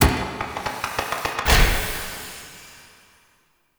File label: Connector Shrine Activate Full; Warframe; Entrati; Ambience